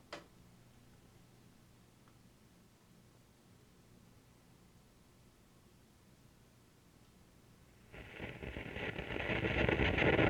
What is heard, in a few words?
organ noise